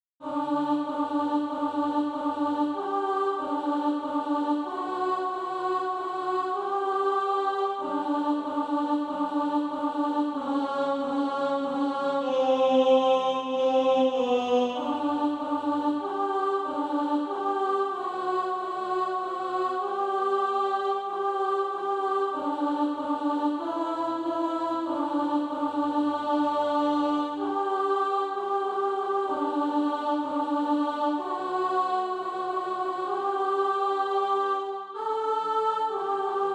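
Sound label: alto, SATB Practise Music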